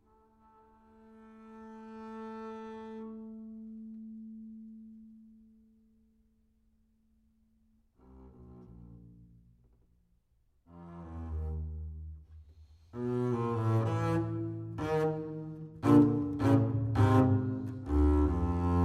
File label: bass solo